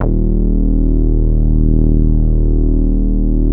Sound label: PL MOOG; Wizoo - Powered Nord; STEREO LAYER